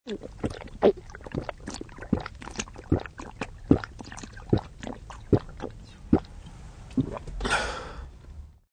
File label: Drink Water, Sound of very fast drinking in throat; Pounding Water 1; Human